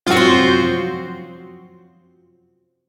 Hirajoshi Chord F; Shawzin; Warframe; Duviri Shawzin

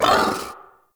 Battle Dog Pain

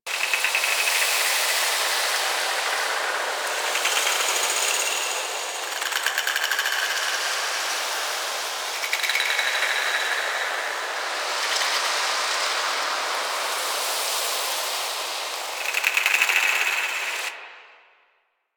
Moon Glowing Tree Loop Large; Warframe; Ambience; Orokin

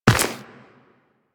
Run Dirt, Footsteps; Warframe; Tenno

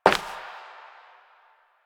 Step Glass; Warframe; Koumei

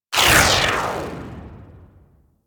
TnT Tail; DE; Warframe; Weapons; Archwing; Powers

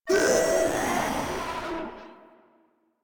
Proto Infested death; Warframe; InfestedNinetyNine

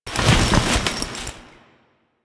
Foley Kneel; Warframe; Kahl

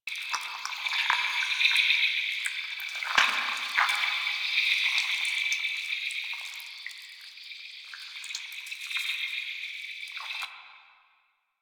Landscape Insect Loop; Warframe; Ambience; Venus Open World; Positional Stereo Loops

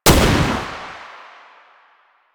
Gunbrella Shot, Weapons; Warframe; Tenno; Koumei

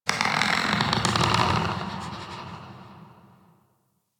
Forest Distant Tree Creak; Warframe; Grineer Forest

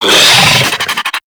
Infested Charger Attack; Warframe

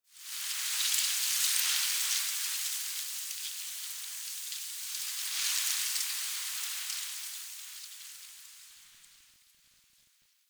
Tree Pine Wind Leaves Relaxed Gust; Ambience; Trees

